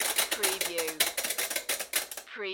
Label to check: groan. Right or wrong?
Wrong